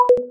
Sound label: pause back click; Snowy Circles NM (v1.1)